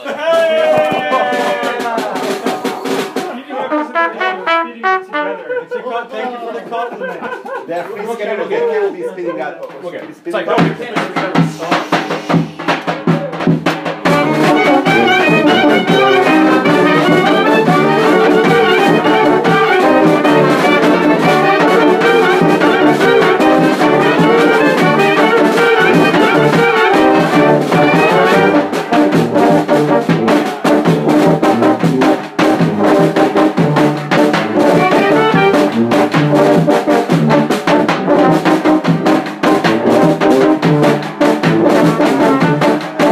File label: Bulgarian 9 Medley